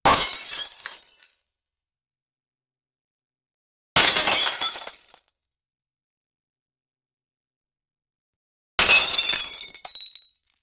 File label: crash and break glass